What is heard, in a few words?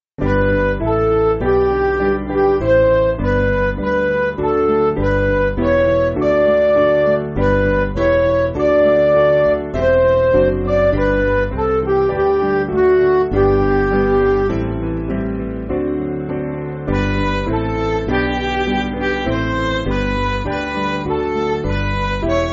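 Midi, Music Backing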